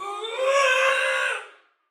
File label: Battle Cry, Female, Soldier; Dialog; Barks; Sinecure